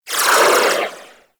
Swarm Missile Projectile By; DE; Warframe; Weapons; Sentinel; Helstrum

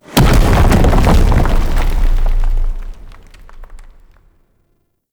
Boulder Land, traps; Objects; Champion Crypt

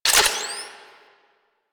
Shotgun Quick Reload Layer; Warframe; Pax Duviricus

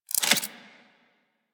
Pistol Reload A; Sybaris

